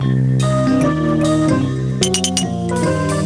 CLASSIQUE

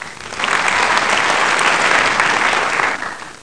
succes

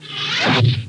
cracheur; Arc En Ciel Et Le Lagon Merveilleux; French Version

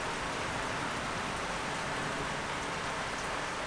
pluie; omikron; CD-Gamer 2000-01

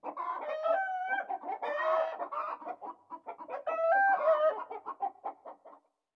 poule; El Rey Arturo

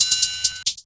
succes

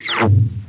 cracheur

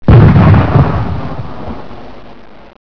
gros canon; Reperages 94; tortue

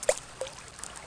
plouf; Emme Arc En Ciel Et Le Lagon Merveilleux

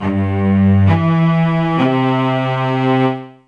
timbr

